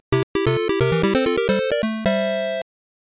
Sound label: Start